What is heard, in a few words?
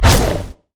pounce; nidalee base